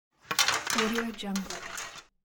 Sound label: plates; minimal studio